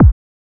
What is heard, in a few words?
BD; E-Lab; X Static Goldmine 1